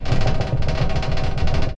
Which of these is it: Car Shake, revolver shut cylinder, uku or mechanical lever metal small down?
Car Shake